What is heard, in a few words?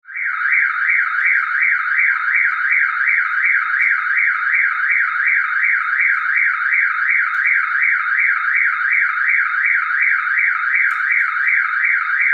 car alarm yelling sound